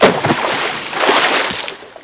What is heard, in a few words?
SPLASH; HELICOP